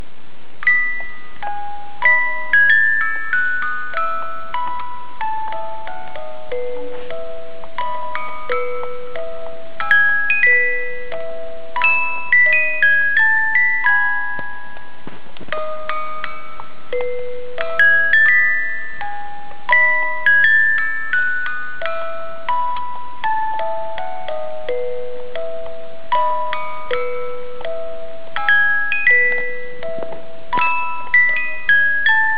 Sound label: Photo Album Music Box